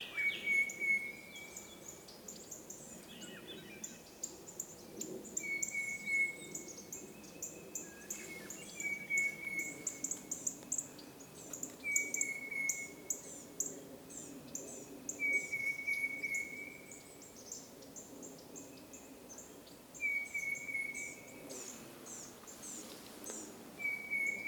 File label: Tufted Titmouse, Falls Lake; Rollingview